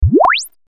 Menu Sound; Twee; Windows

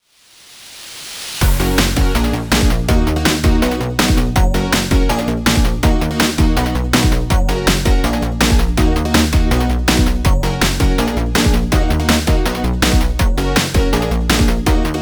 Instrumental

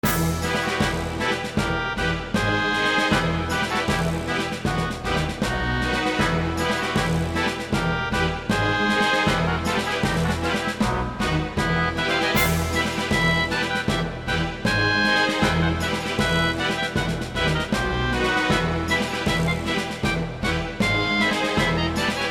bouncy; Warhammer: Dark Omen

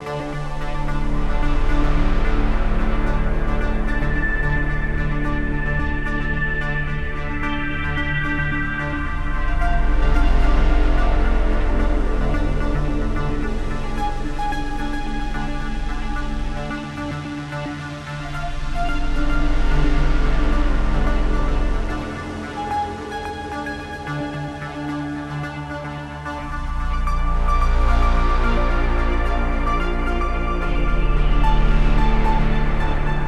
Moon Patrol FX Stem Loop; Headlander

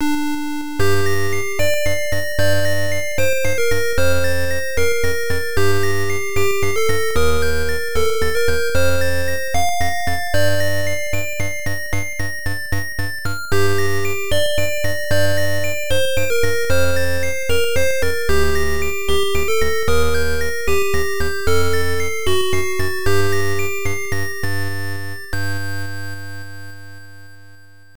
Funeral March, Game Over; herzog msx

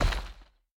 Moving Obstacle Stop Debris; boomerang fu

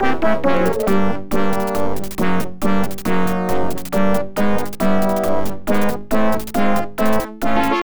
Imperial Capital Ship Arrives; Star Wars X-Wing IBM PC AT

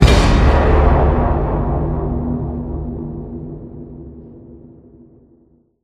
Mission Failed; N.O.V.A. Legacy; android ios mobile online gamerip 2017

